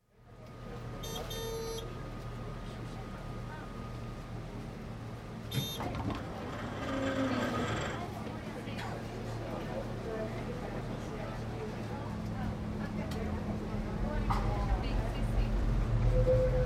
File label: Tram, Prague, internal, starts and stops, with PA announcements, chat etc; Train; BBC